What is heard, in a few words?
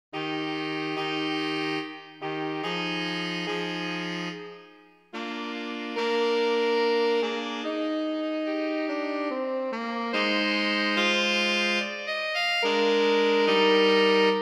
Lascia Ch'io Pianga Sax